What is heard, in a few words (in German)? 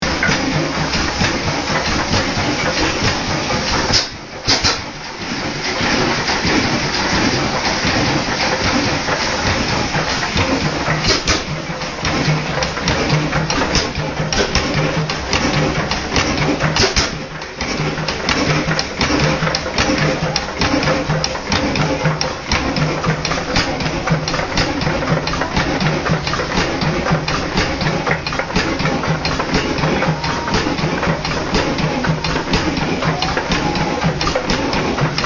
Alter Schiffsdiesel